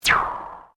ENERGY WEAPON SMALL DISCHARGE, Created energy weapon effects; Machinery; Machines